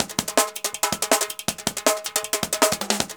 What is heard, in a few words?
160, DRUMLOOPS